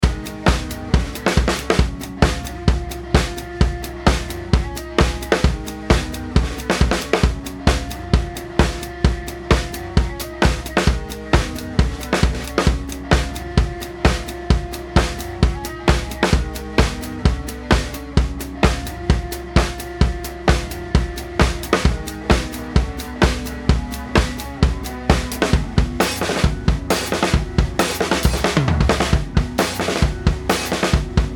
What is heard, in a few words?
Separation Drums